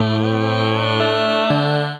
Combined Rack (Root A), Basic Vocal Racks; Voice Box; Harmonic Vocal Racks

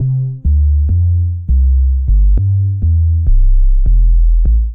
3 OP Boom groove 101 bpm; MIDI Clips; Ableton; Factory Packs; Beat Tools

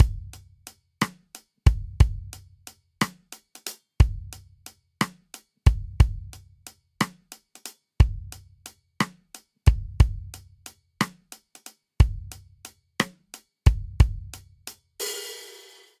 Swing Six RnB 01 Intro 6_8 90 bpm, drum; Ableton; Factory Packs; Drum Booth

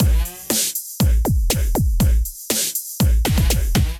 clubber kit, drums; Ableton; Build and Drop

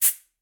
Cabasa Long; Latin Percussion; Drums; Drum Hits; Shaker; Ableton; Factory Packs

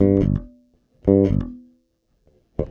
088 Dance Pop Bass G, E-Bass; Pop Rock

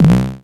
Uncertain Depth, Analog Bass; Ableton; Retro Synths; Analog Bass Vol 2

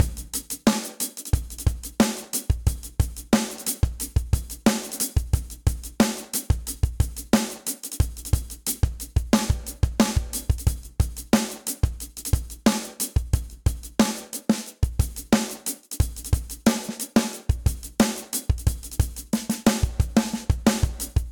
Snare Cold Tight Room 90bpm; Gallop; Ableton; Factory Packs; Session Drums Studio

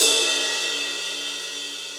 Ride Edge Reso Classic; Drums; Drum Hits; Standard Hits; Ableton; Factory Packs; Session Drums Club

